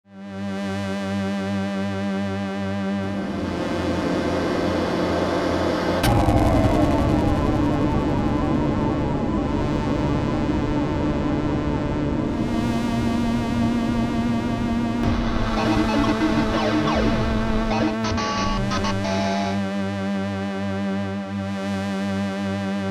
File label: barka chiptune